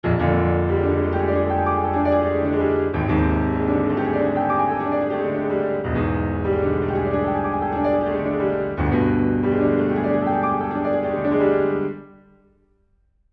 Steinway B all mics

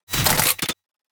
Transform Gears; Tsugi; DSP Action

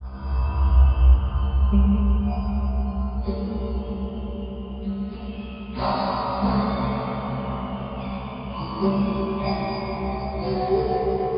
DRONE murmurations

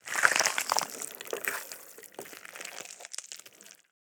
Foley Gore

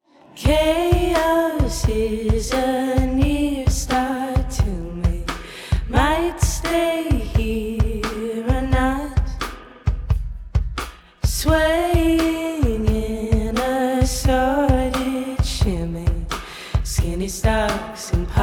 vocal drums

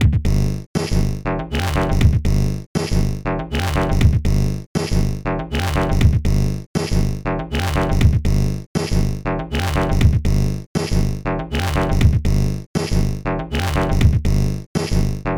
Modular Samples; sampling instrument; Rando by Monkeyc

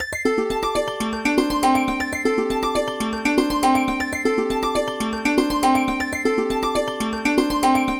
santoor loop 12 EDO

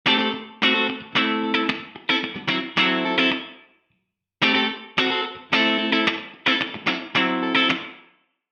Guitar No Duck and Gate

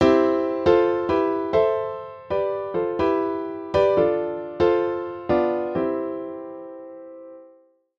chord trig major triads quantized

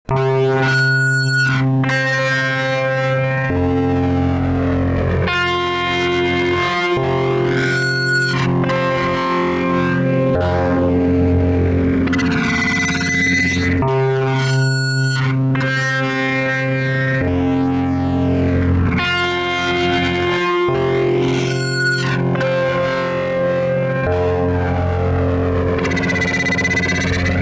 Gtr distorted trem

